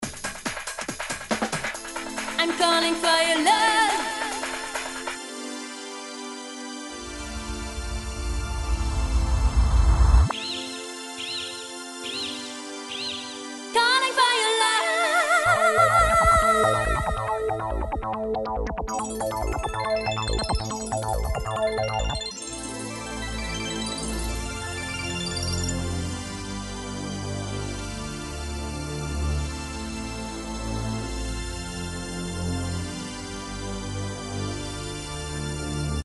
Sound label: JAZZ